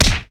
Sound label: Weapon Punch